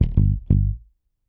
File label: Mute Rhythm, Bass; Construction Kit; Riffs, Patterns Licks; Bass X